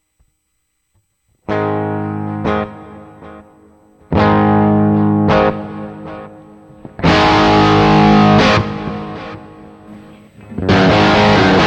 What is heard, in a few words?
tech21 flyrig v2 line